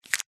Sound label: money 5 dollar note grab snatch; foley; zapsplat